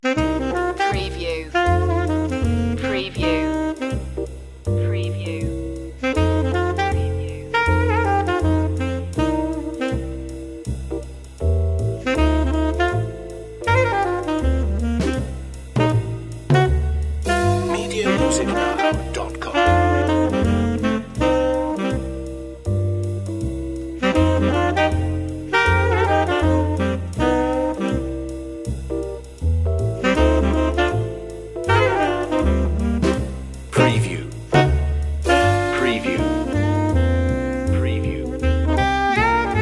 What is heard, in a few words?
blues